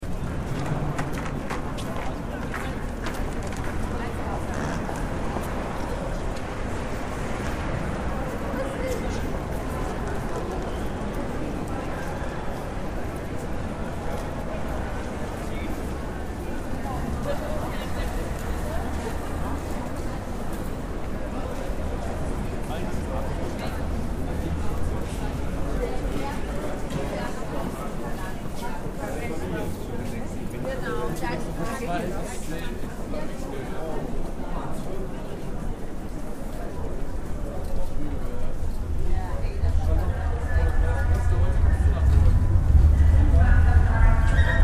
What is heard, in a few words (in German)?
hbf hauptpassage unter den gleisen; koeln